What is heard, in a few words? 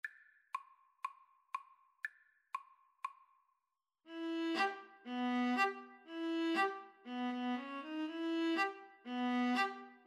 Bulgarish viola viola